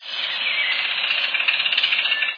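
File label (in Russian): белка что то кушает в парке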